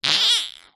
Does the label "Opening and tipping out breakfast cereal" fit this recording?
No